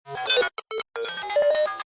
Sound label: science fiction robot beeps processing